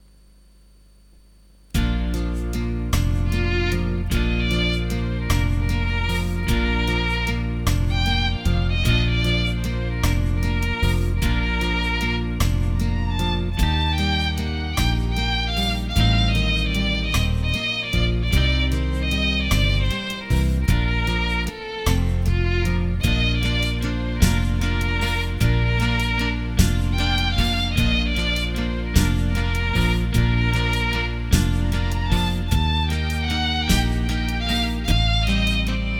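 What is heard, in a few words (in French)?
menuet, si bémol majeur; haydn joseph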